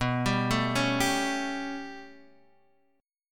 B 7th Suspended 2nd Sharp 5th B down Guitar Open G